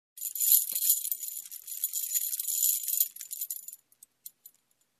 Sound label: Little Metal Fragments Rustling